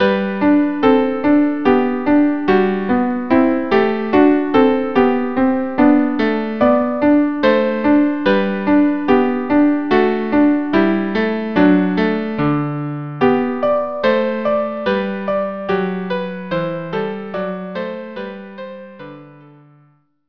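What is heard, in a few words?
viola viola; Schumann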